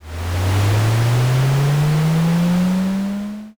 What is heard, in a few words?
airplane